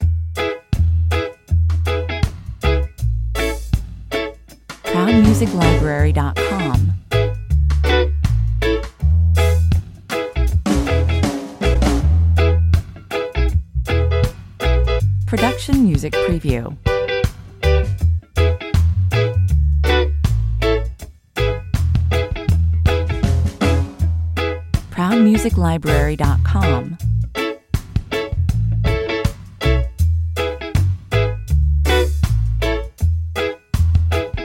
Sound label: reggae, 80 bpm; talkover